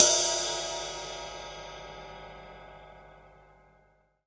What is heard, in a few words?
CYMBAL, RIDE; Sonic Foundry (Sony Creative Software) - Loops & Samples Drum Tools; Laid Back